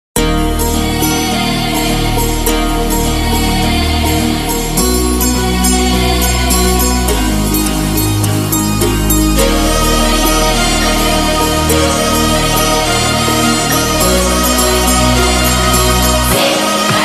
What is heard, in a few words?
funk